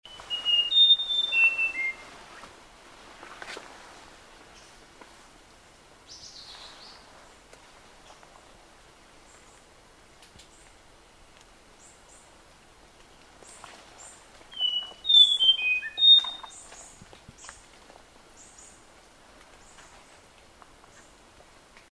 lesser ground robin

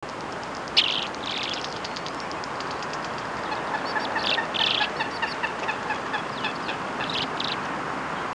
white rumped swallow

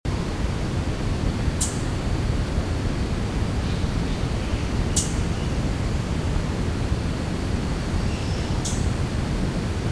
orange billed sparrow

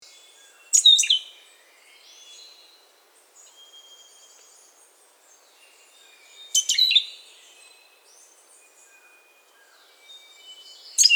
large footed finch

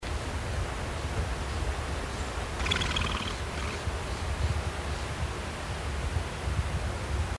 bare eyed ground dove

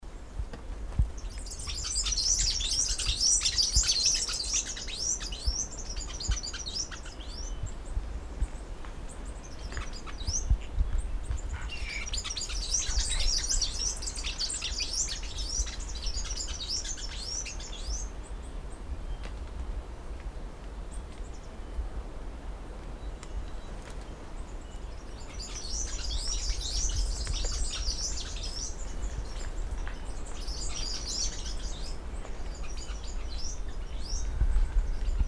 yellow-green finch

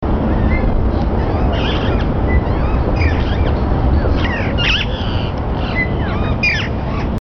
alexandrine parakeet

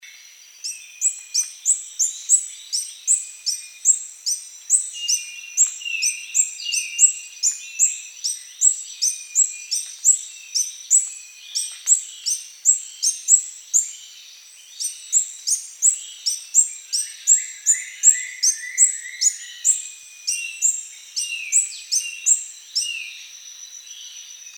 tooth billed hummingbird

